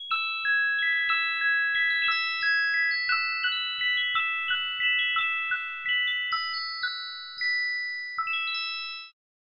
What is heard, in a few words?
Magical Music Box; Waverator Spectra